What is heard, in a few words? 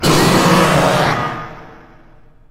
jumpscare; grunkfuss